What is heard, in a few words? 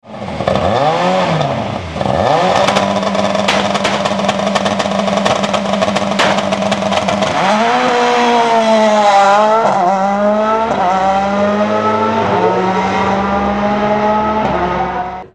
Skoda Octavia WRC, start; monza 2007